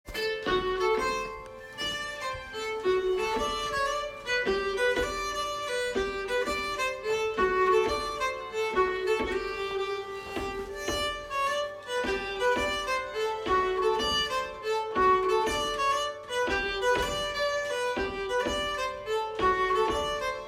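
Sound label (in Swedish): Polska, säckpipa; efter Gustav Ahlund Gallersta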